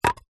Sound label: wood small thin piece set down